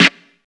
acoustic snare f key, steel snare drum tuned to the F note. Loudest frequency: 2234Hz; snare drum samples